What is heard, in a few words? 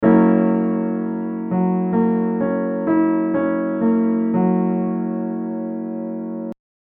Har7 chord